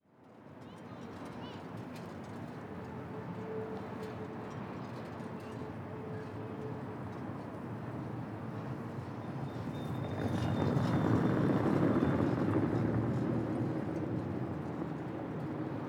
Distance City Ambience; City Sounds

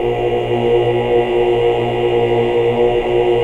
OOH OCT

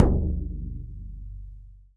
BODHRAN, Celtic Percussion

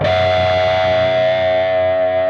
PRS PINCH, Guitars; Spectrasonics; Hans Zimmer Guitars VOL-1

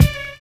SLOW; Univers Sons

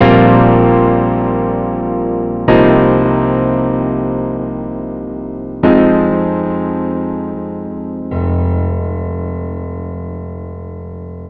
160 Fmin, Keyboards; Total Drum&Bass; 90 s Sample CDs

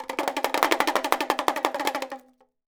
Damaru; Polyend; Simulacrum Sounds; 1 Shots; Acoustic and Electric; Drums

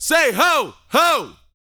VOCAL, R&B Dance, HIP-HOP; Spectrasonics Vocal Planet

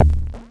Cwejman S1 Thonk Kick

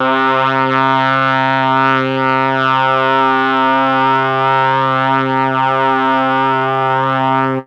MEL BRASS; ILIO; Propeller Island; Legendary M400

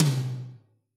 Toms, Yamaha RY20

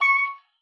OBOE2, C#6; Xsample VOL-6

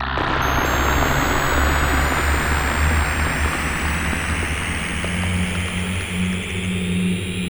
Long Decay, FX

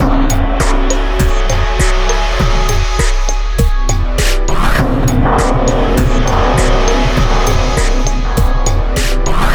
MIX, BACKGROUNDLP; Best Service ProSamples vol.49 - FX-Area; AKAI

